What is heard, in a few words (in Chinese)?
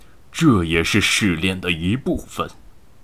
受击, BattleCry; ycrpg; 大胡子